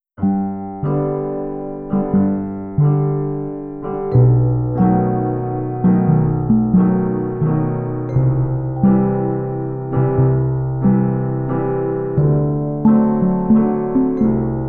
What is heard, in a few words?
G Piano; Tender